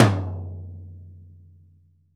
P.LTOM F, VELO TOM

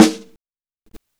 Snare Live, Drum and Bass; EZ Rollers; Instrument Samples

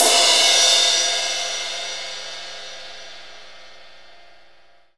ZILDJ C; Keith Emerson Signature Series

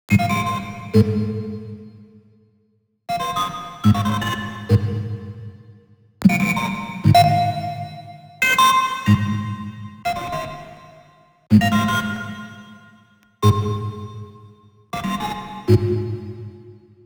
glitch

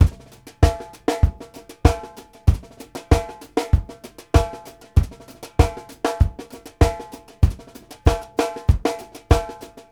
FOUR ON TH L, New York City Drumworks, BR+STK 96; Sampleheads; New York City Drumworks VOL-1

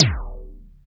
RES TEKS; Drums In Your FACE!

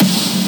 SN Big; VEC5 Multi Loops

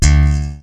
FACTOR SLAP D, BASS; Greytsounds

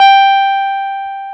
Jazz Guitar; Club-50 - Foundations; Jaz-Kessel

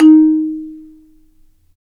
Marimba; Roland LCDP11; Africa VOL-1; Af.Marimbas

